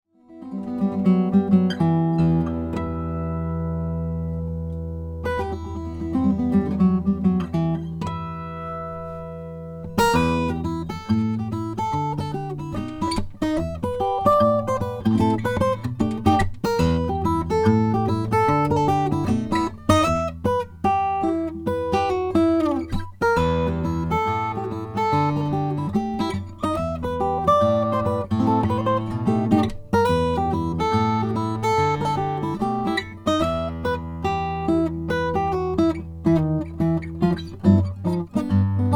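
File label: fylde custom falstaff brazilian rosewood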